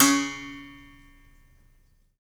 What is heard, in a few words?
Berimbau; Roland LCDP11 Africa VOL-1